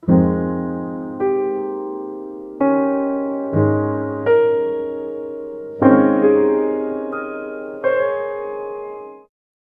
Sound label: a soft melancholic piano; melancholy